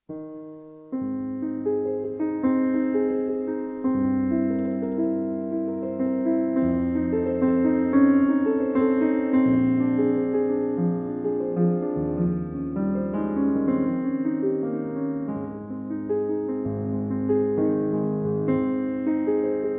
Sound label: Love Dream No 3 in A Flat minor; Liszt; piano